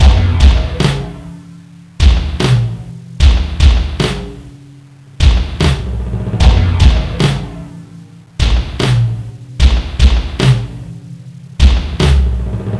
Trip Hop, 75; Sonic Foundry; Crimson Blue and Fabulous Horncraft 4 RnB; Funkyxtreams

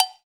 AGOGO L, ETHNIC PERC; Roland LA

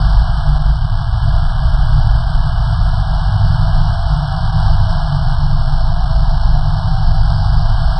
Drone Ambient; Drums & Drones

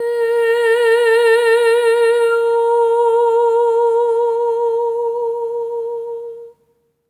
EH OH A#4, SOP; Spectrasonics; Symphony of Voices

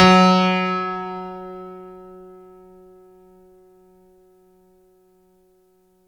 F#2, synth; 90 s Sample CDs; NiceBeats; SynthLine VOL-26

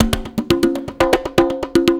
120 BONGO; Best Service Advanced Media Trax Modern Composer

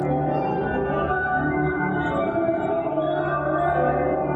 Tonal Re Draw, sparse soundscape

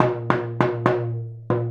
Mallet Indian Drum; Sonic Foundry (Sony Creative Software) - Essential Percussion

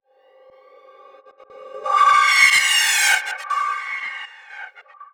Stuttered Tie Fighter

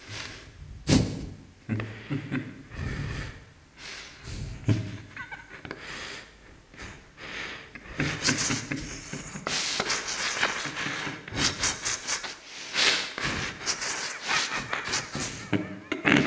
Scratch Laugh; Zero-G - Ghost In The Machine

